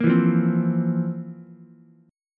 F Major 7th Suspended 4th F, Ukulele

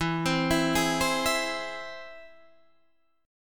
E Augmented, Guitar, Standard; E+ arpeggiated; JGuitar

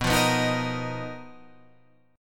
B Major 7th Flat 5th B down, chord shape

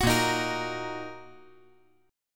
Bb Suspended 4th Sharp 5th Bb down, chord; strummed

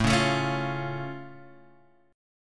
C sharp Augmented, A

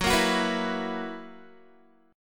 Gb 11th, Guitar

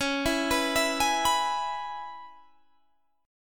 C sharp Minor 7th C sharp Guitar Standard; C#m7; arpeggiated; JGuitar

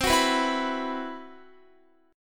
C Major 7th Flat 5th C, Guitar; CM7b5 strummed

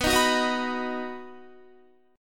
B Major, Guitar, 4F,4A,5C,5G,5C,6E; strummed; 18,18,18,20,18,19

